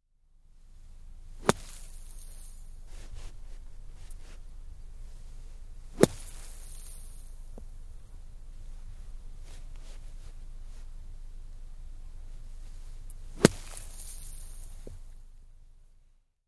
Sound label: Golf, three bunker shots; Part of Golf (Sound Effects:Sports); BBC